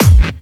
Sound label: Bassdrums Clubby Kicks; Vengeance Samples